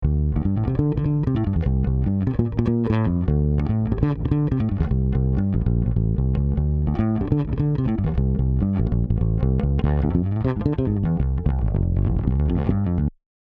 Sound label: Chandler Bass Lim 16db gr; Waves